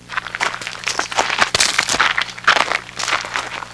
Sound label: Footfalls Walking In Gravel; footsteps